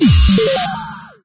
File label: small medkit; svencoop; items